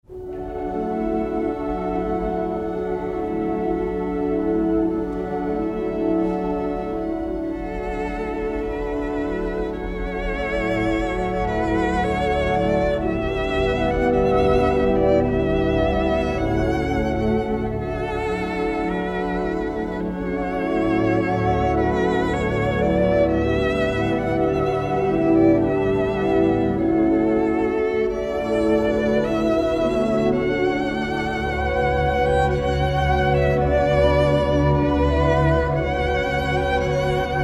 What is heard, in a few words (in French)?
CONCERTO EN DO MAJEUR POUR VIOLON ET ORCHESTRE Andante sereno; HUBEAU